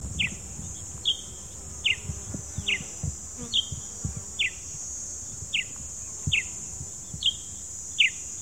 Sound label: Petronia yellow-spotted, Lake Chala; African birds; Stein-Nilsen